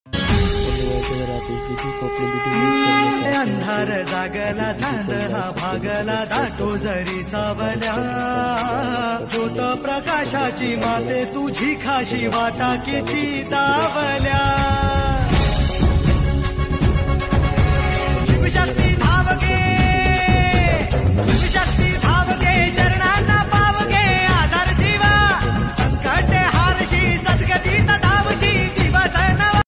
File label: navratri ringtone